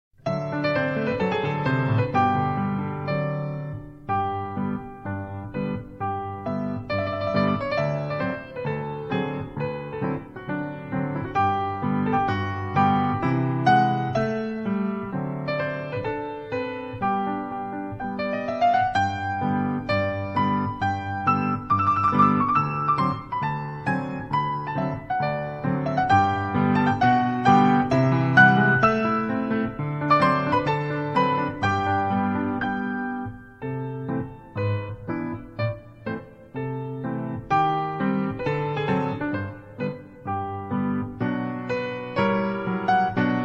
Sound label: Battement Tendus And Battements Glisses